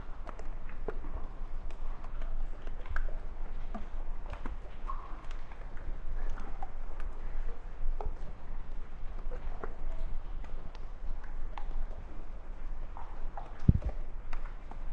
ice cave; casualtiesunknown

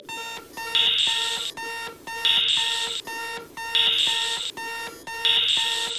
health panel alert; casualtiesunknown

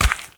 Footstep; casualtiesunknown

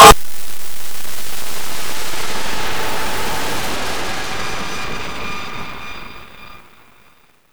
sonar ouch; casualtiesunknown

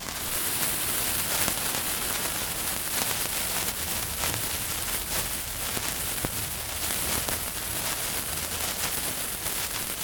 fuse burn; casualtiesunknown

